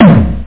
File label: big tom